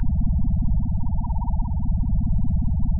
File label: Drone; rabbids multiverse; iOS, macOS, Mobile